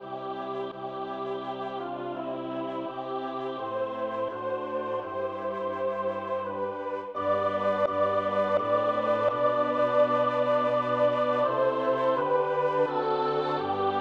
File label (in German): Deutsche Messe Sopr.; F.Schubert